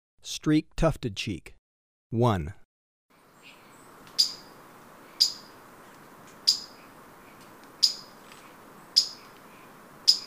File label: Streaked Tuftedcheek, Birds of Ecuador; S. America